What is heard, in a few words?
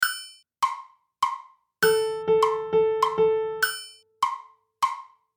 group notes sound quadruplet